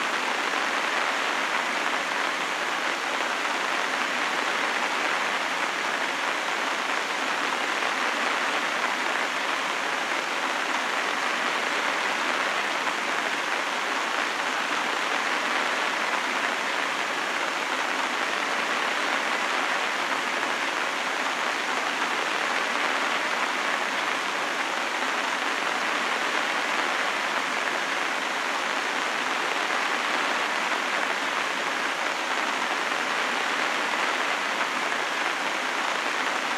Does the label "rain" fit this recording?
Yes